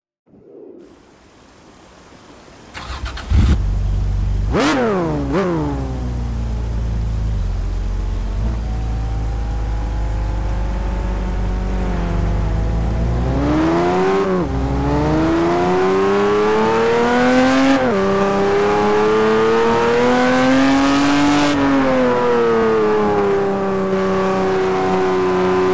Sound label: acura nsx; Acura NSX NA2; TDU 1 - Sound mods by Reymondox